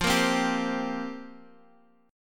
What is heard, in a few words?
F Suspended 2nd Suspended 4th, down, Guitar, Open F; Fsus2sus4; strummed